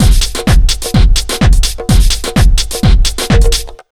127 BEAT, Disco House

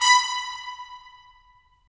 BRS, Tps Stacc; Roland - LA Composer vol. 4

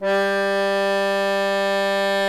KEY, Bass Accord; Club-50 - Foundations Roland; Accordians

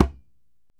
DJEMBE, African Drum

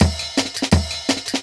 Burp, Jungle Breaks; Original Jungle Breaks

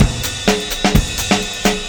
127 bpm, breaks; Zero-G Beneath the Planet of the Breaks

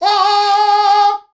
AAAAH, SHOUTS; Best Service; Hyper Dance

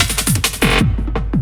LOOP SD, Drum & Bass, 170 BPM

